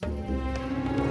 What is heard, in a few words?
Music Main Amorce 108bpm; Act Egypt